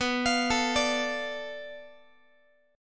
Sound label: B Minor 7th Flat 5th B Guitar; Bm7b5; arpeggiated; x,19,20,19,18,x; JGuitar